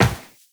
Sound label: Run Metal, Footsteps; Warframe; Tenno